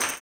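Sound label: tambourine, 160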